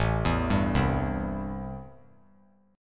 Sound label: A-Minor 7th A; Am7 arpeggiated; JGuitar